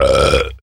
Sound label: burp short